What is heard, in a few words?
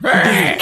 pig scream short